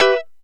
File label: Lounge Guitar Chick 9ths A3; Lounge Guitar Chicks